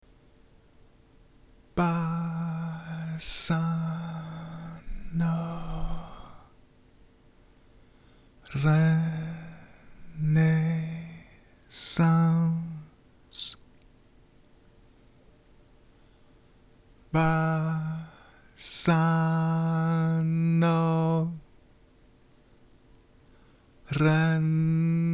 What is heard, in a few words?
Em 140 renaissance guitar impro